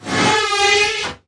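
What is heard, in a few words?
Creature Poltergeist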